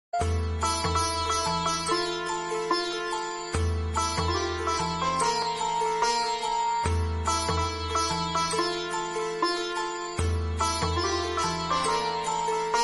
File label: instrumental ringtone